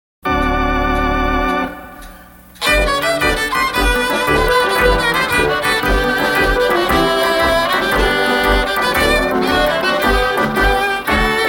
instrumental

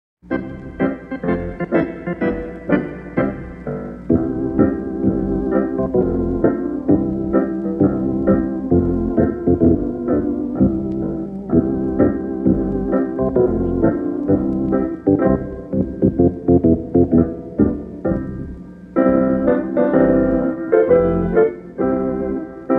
instrumental

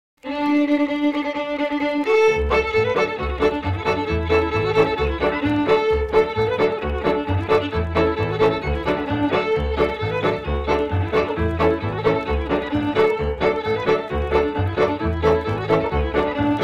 instrumental

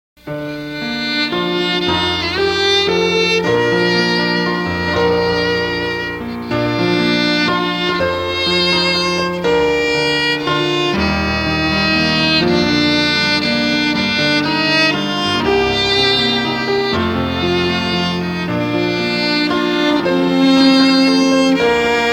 instrumental

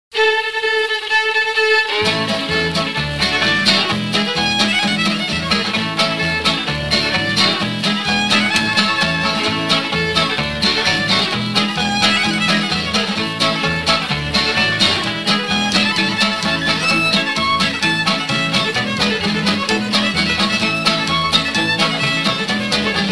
arizona stomp (instrumental)

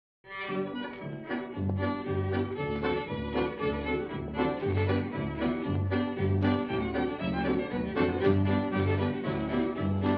instrumental

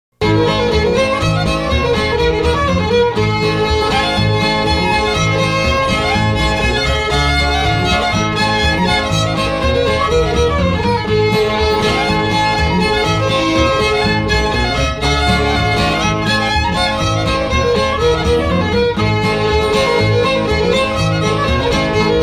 instrumental

